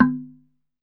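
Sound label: TUNG LO, Tongue Drums, Pitched Percussion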